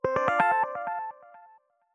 jingle; PortesOuvertes

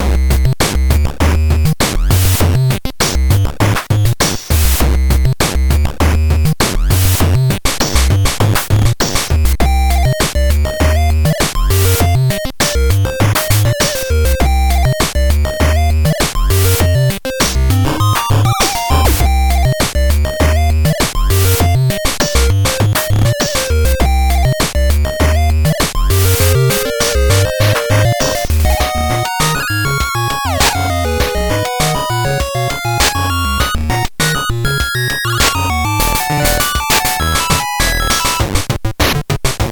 Reeds; World 2 Factory

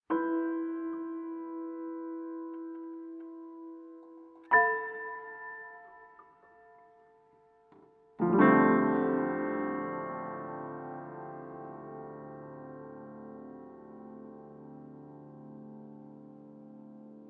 tune

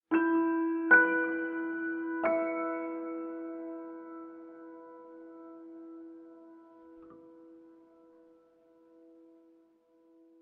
tune

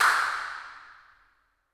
incinerator dirac

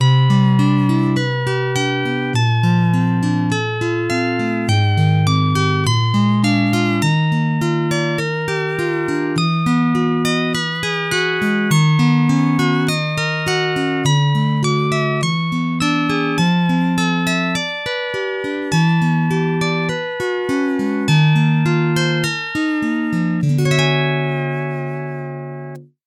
busker version, guitarist; Mildly important information; intradiegetic

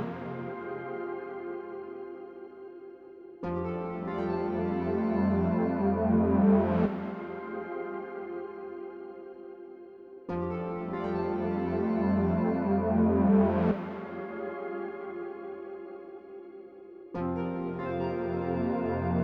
puzzle action loop layer harmo; freeflight

